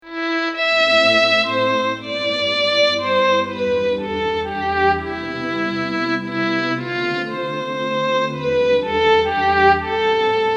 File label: Violin Tape; VIVO S10